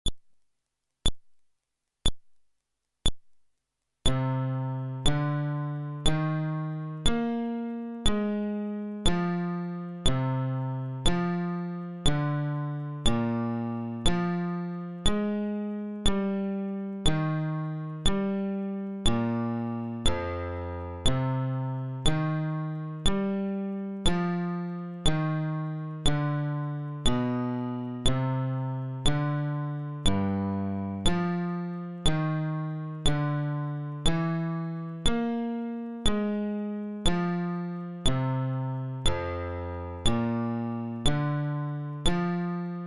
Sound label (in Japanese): フレットレス